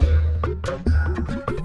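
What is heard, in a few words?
Music Dpad 145 Maxisphere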